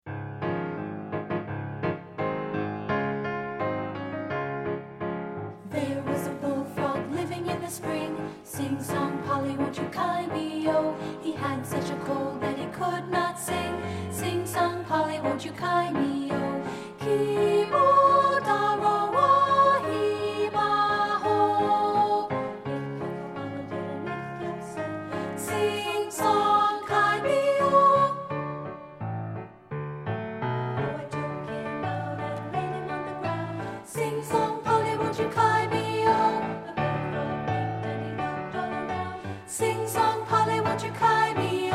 The Bull Frog 2 PT 1, two part; partbypart

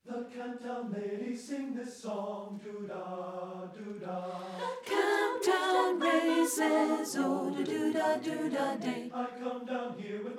ALTO; satb

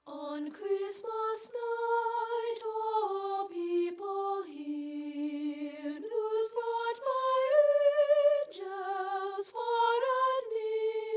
ON CHRISTMAS NIGHT SOP; ssa

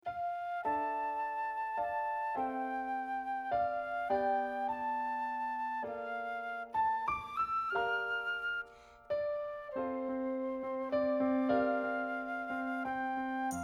ALTO

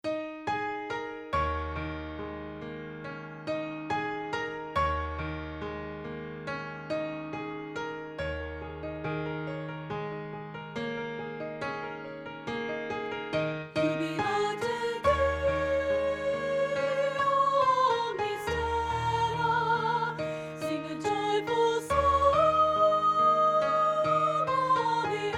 JUBILATE SOP; ssa

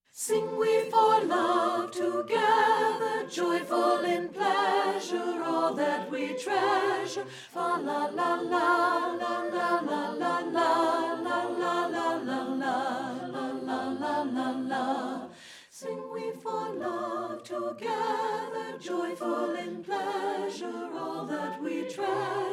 SING WE FOR LOVE TOGETHER ALTO; partbypart; satb

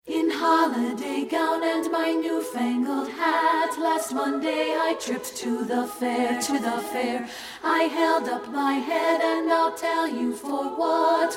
alto

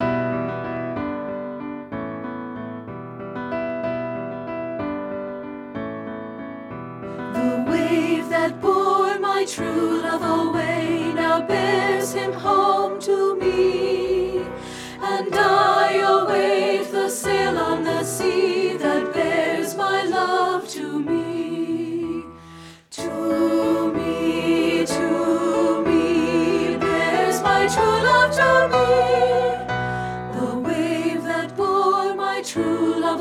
ALTO; satb

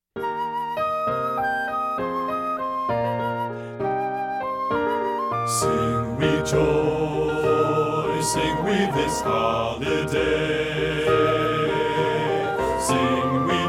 SING WE HOLIDAY JOY BASS, satb; partbypart

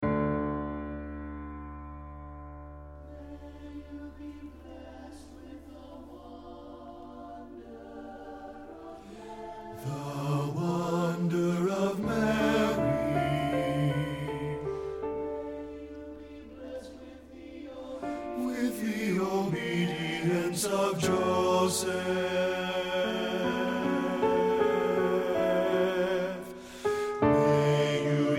BASS; satb

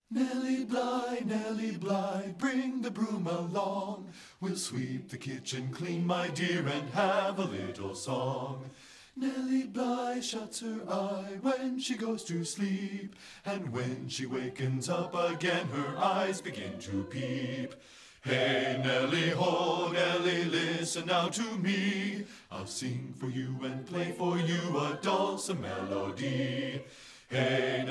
BASS; satb

